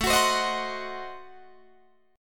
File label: A Diminished 7th A down Guitar Open D